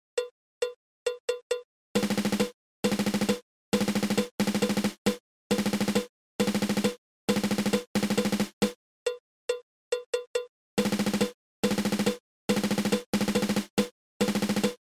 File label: Single Stroke 7